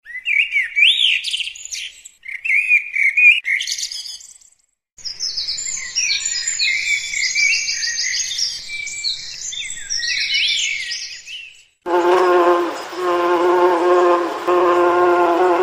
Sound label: garden sounds